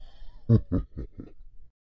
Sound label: evil laugh; soundbible